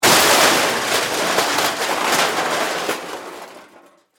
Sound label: Crash Metal; Crash Sound Effects; Objects